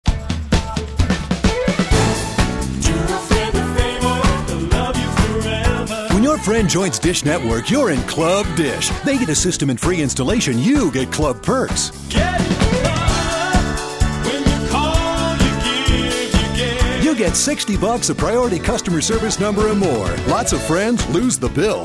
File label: Club Dish Mix, drum